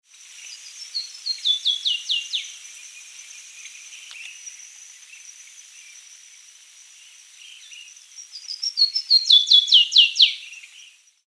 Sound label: Basileuterus flaveolus songs; Surubi-i